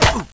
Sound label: pain, player; cstrike